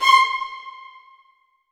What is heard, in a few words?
C5, hall strings; AKAI S-Series CD-ROM Sound Library VOL-7